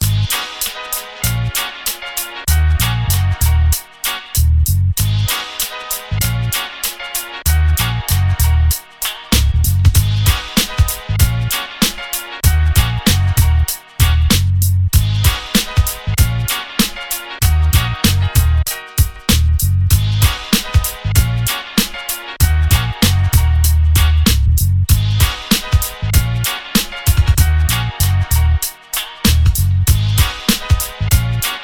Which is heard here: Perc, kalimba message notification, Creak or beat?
beat